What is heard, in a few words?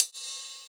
Hhat, Hihat; Equipped Music; Loungin House